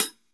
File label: hat closed, Live Kit; Sonic Foundry (Sony Creative Software); Ugly Remnants VOL-2; Single Shots; Beatstuff